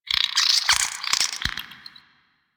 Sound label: Drifter Camp Valley Water Creature; Ambience; One Offs; Warframe; Quests; The New War